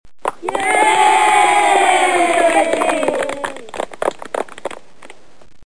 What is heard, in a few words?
愉快 applause